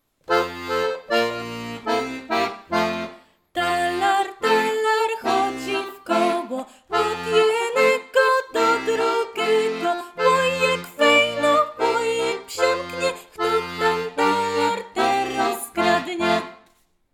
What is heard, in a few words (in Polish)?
Talar talar spiew; gwara